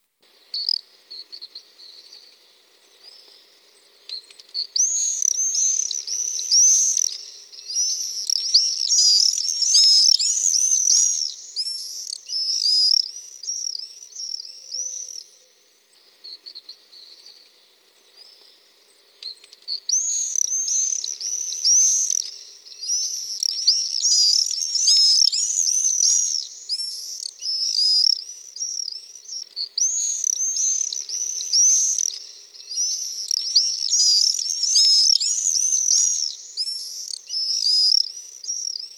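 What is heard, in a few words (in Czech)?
rorys obecny, common swift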